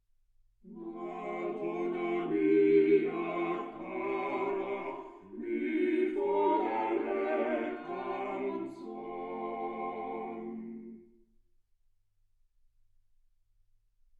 quartet

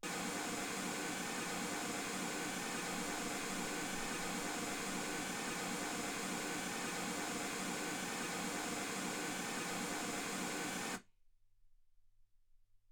mls 48kHz

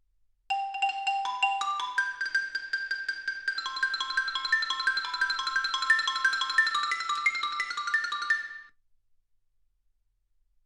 xylophone

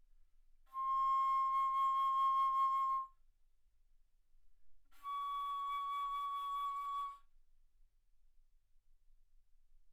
Bass Flute ff C6 Db6

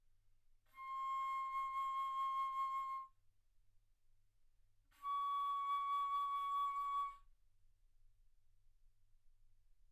Bass Flute ff C6 Db6

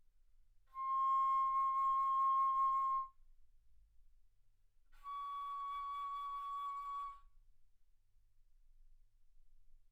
Bass Flute C6 Db6; ff

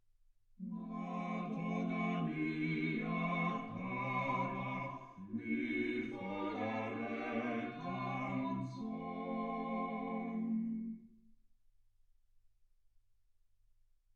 quartet

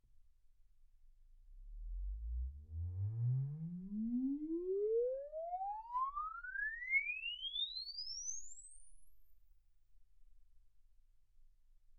exp swept sinus 10Hz 24kHz

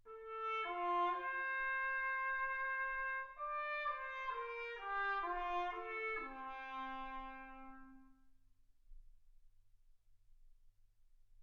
trumpet

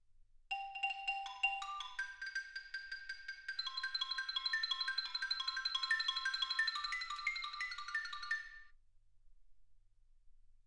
xylophone

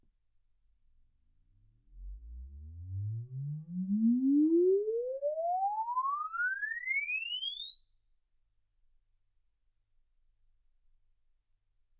exp swept sinus 10Hz 24kHz

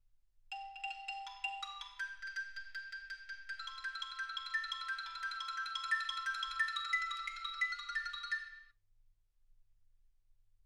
xylophone

